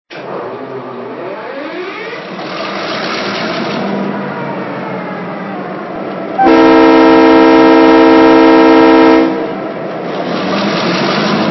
SW-1, trains

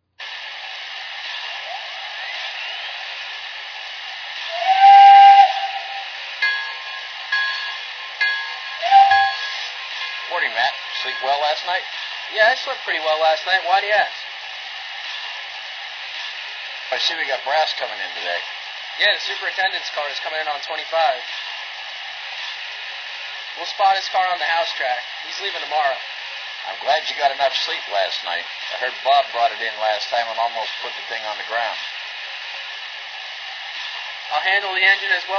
B6, trains

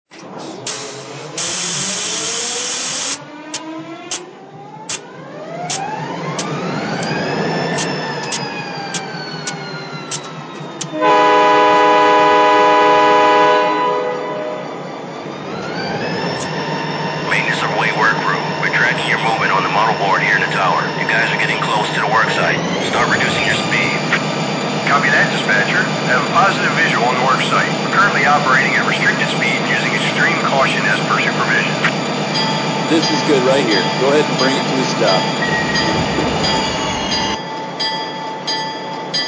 GP40

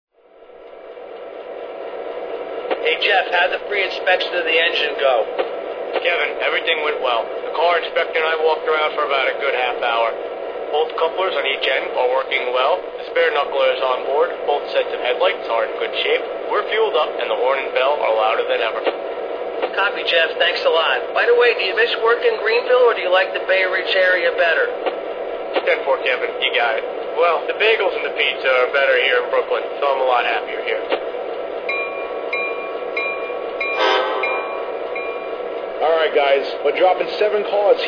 mp15dc; 5101

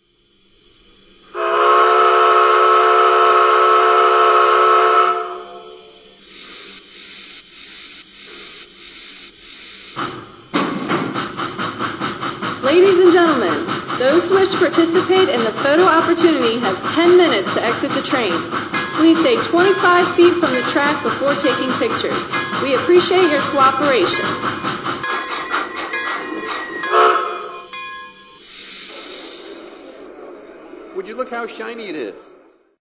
T1

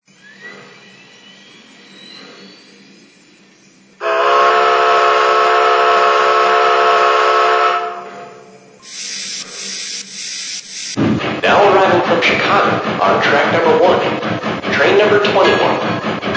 462 Pacific

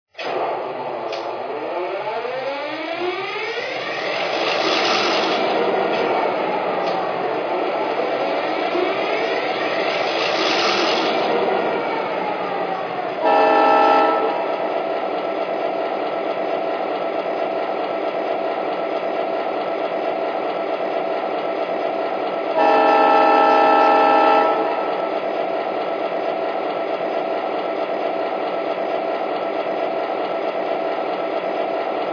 e8, trains

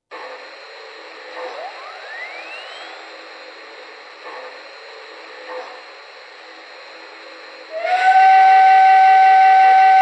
decapod

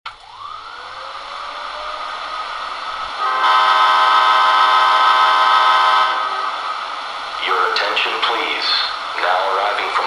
AEM7

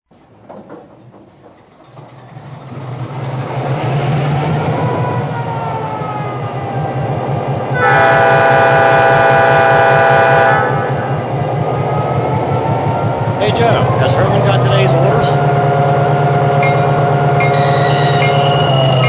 EMD F-7, trains; 274A; GN

